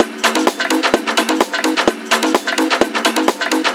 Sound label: Percussive Loops 128BPM; Vengeance Samples